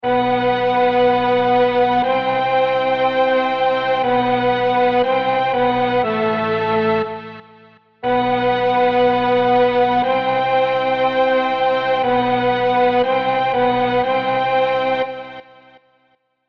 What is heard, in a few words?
M-Tron Pro Strings Flute Mix